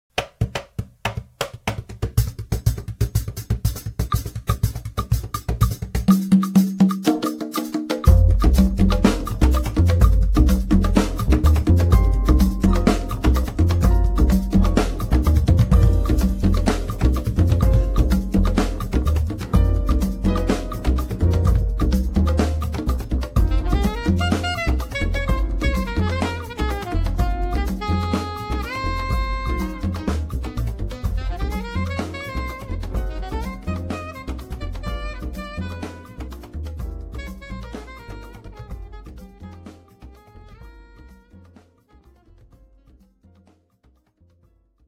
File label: rumba